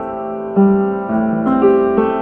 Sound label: sustained piano loop; MELODIC LOOPS